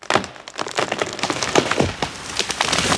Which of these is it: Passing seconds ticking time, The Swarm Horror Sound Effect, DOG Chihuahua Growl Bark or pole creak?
pole creak